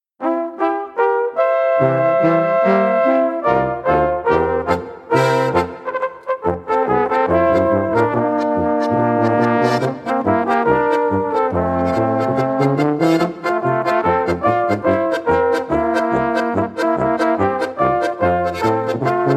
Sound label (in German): polka